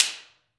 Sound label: SLAP STICK